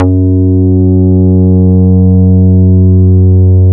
MINI ATTK F#2, MINIMOOG; Big Fish Audio - First Call Vintage Keyboards

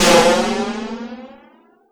CYBER KIT, DRUMS

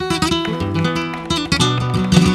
GUIT SOLO; Best Service; World Colours

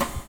SHUFFLE; Univers Sons

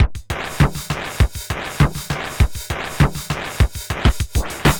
Robo Beat 100BPM, sci-fi Beats

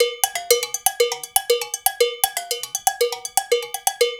LOOP, Percussion

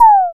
tom, Electro-Harmonix DRM-15

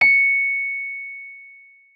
MK1 C#7, RHODES; Xsample VOL-1

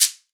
perc shake

